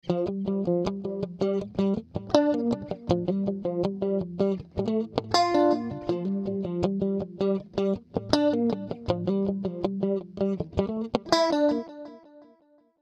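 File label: Electric Guitar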